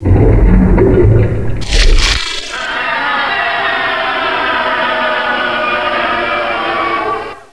mortar fire; tubequeen

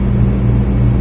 machines

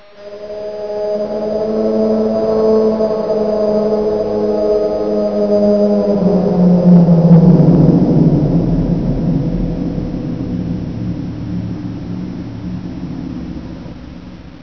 overlord flame die; custom monsters

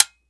empty, m249

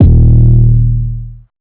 hip hop

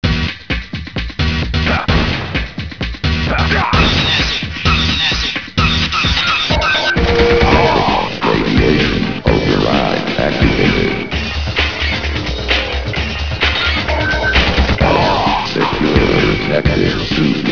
negative; votemap

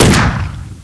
gun; vengeance

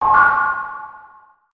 hover, buttons; digilab

